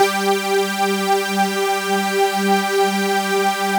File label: G-3 PLSWID, PWM; AKAI S-Series CD-ROM Sound Library VOL-7